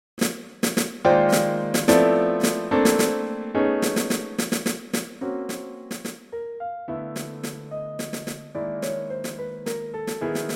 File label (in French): Piece n°2 Conducteur sans claves a 72 bpm